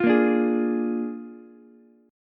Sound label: Bb 7th Bb down Ukulele; strummed; JGuitar